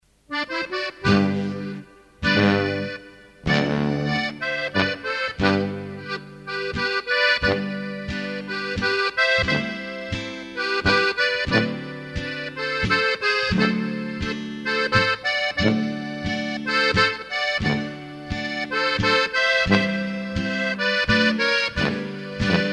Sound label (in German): Plattler; Gauschlag